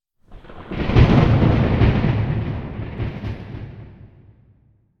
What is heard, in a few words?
thunderclap